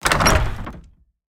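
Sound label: wood double light open, door; skyrim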